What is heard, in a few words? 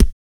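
vox percussion, Vocal Planet Jazz FX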